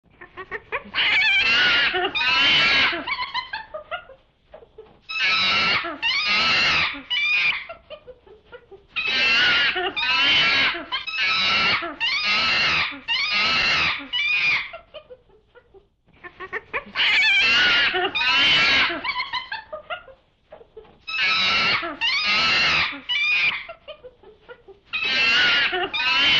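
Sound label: shimpanze golos, chimpanzee voice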